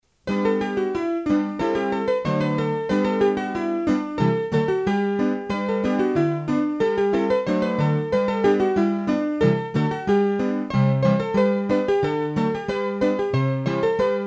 Scottische, hurdy-gurdy

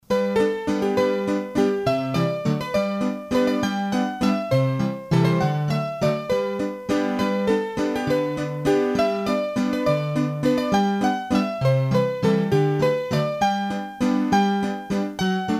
Valse, hurdy-gurdy; Valse a Bargoin

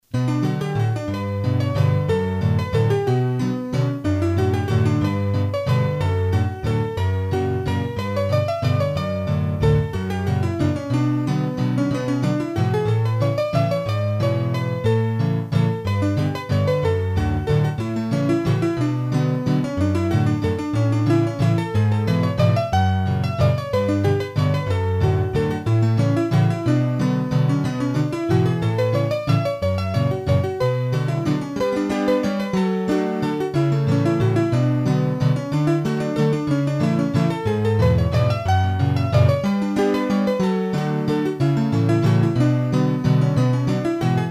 hurdy-gurdy, Valses; Bouffe Doigts

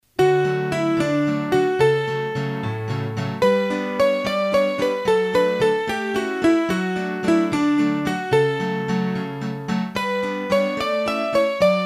Valse, hurdy-gurdy; Valse a Bouffard; Massif Central

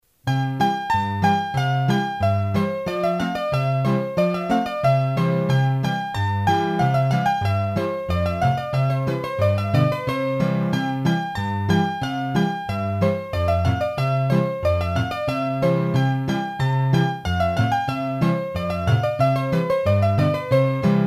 Scottische Landes, hurdy-gurdy; Massif Central

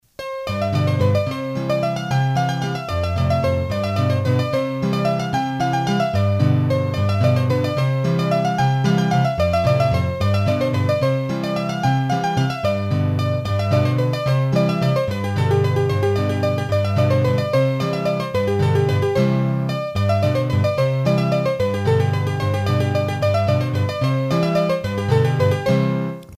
Bourree, hurdy-gurdy, Auvergne

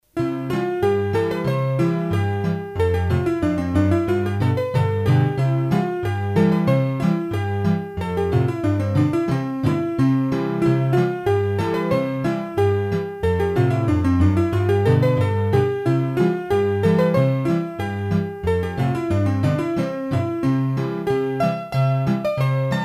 Scottische, hurdy-gurdy

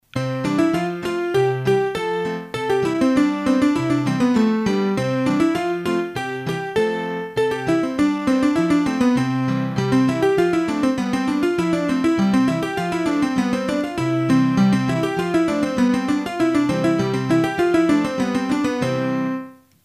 Scottish, hurdy-gurdy; Massif Central

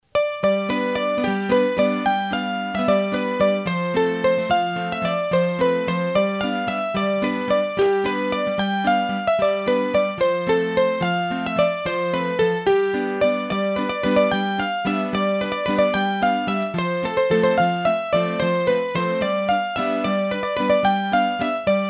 Valses, hurdy-gurdy; Gitane; Massif Central

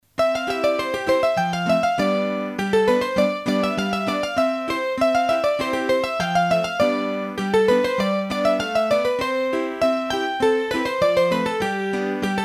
Scottishce, hurdy-gurdy; Massif Central; Scottishce a Catinaux

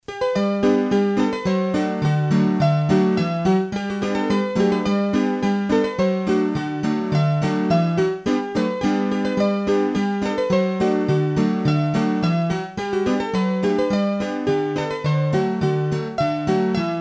Polka, hurdy gurdy; Polka de Baugy; trad

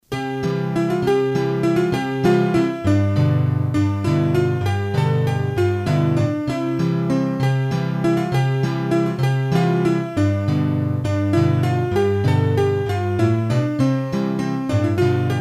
La Crousado, Bourees d'Auvergne, hurdy-gurdy

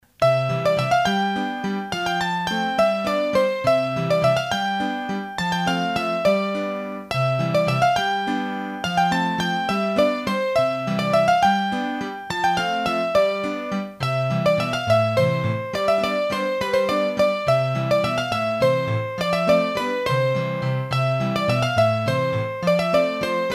Bourree, hurdy-gurdy, Auvergne; Massif Central; Bourees d'Auvergne

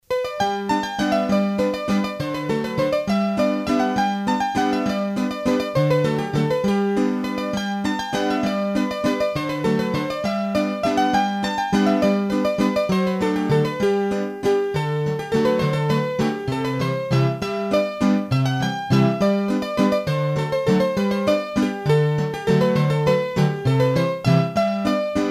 Valse, hurdy-gurdy

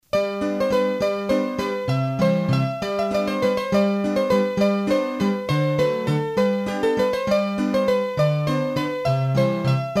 Valses, hurdy-gurdy; Rose

